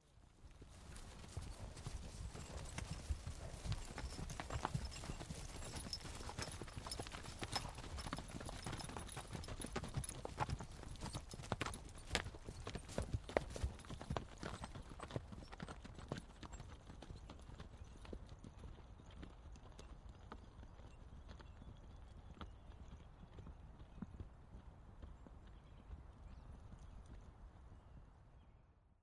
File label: horseback riders